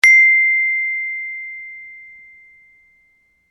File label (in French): sms; La Perruche